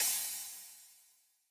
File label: CrashCymbal